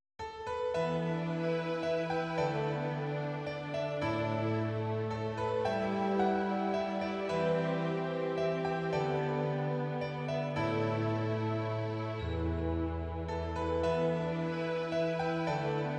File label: piano